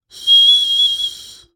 Bird whistle, clay, painted, dry, airy, wispy, phrase, short, quiver, warble; Eiravaein Works; Vaeyan IV